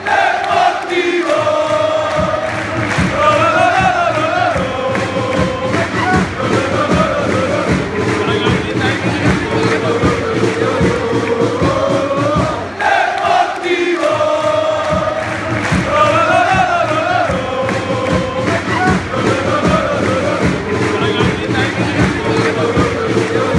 deportivo lolololo, football songs and soccer chants